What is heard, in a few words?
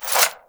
Unequip Dual Daggers; Warframe